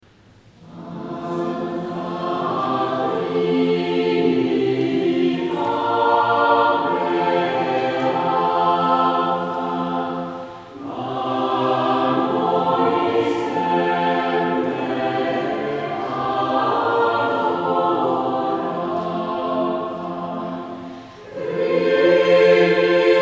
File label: Alta trinita beata, vokalensemble